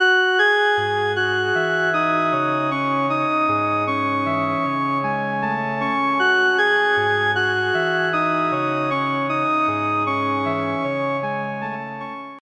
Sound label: Rotary Speaker Revolving Multiplier Organ Dry; AmpVibe; Syntheway